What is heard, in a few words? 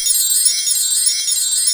WINDBELL C5